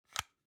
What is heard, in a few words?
object plastic hook fold in; smartsoundfx